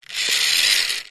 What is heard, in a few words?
leisure rc car roll wheels mechanical whirr short